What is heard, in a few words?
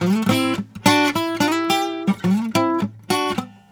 Blues Guitar; ILIO; Fretworks